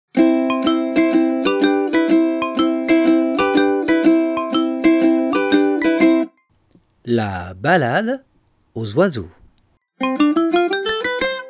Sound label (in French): pivert; la balade aux oiseaux